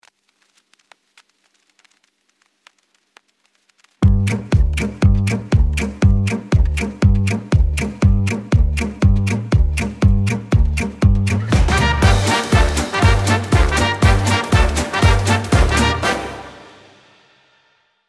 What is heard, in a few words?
music